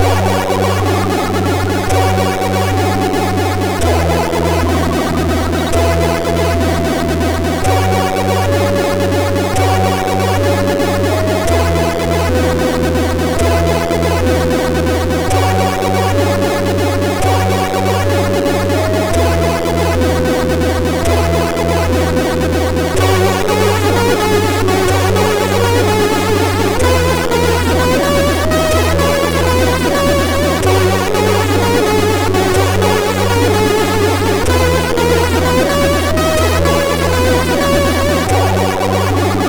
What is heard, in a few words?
Crack Intro, sid MOS6581R4